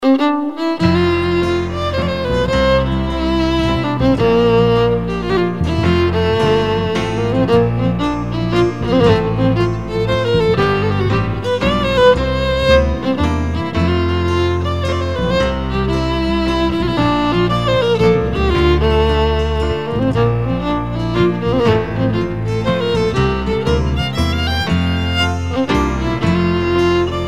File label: Melancholy Waltz